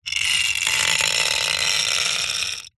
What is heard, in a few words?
industrial metal lever pump squeaky creaky single very slow action; zapsplat